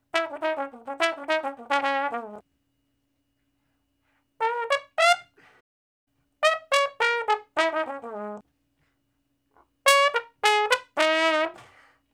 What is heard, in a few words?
Trombone Slo Blus (Db IV, IV, I, I); Crimson Blue and Fabulous Horncraft 4 RnB; Horncraft for R&B; Solos